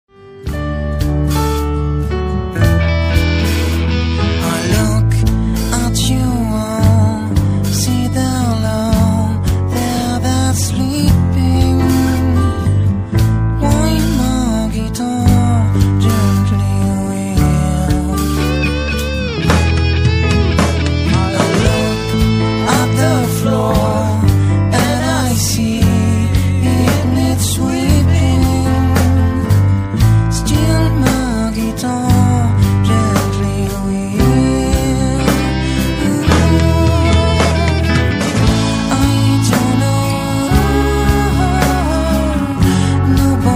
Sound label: extrait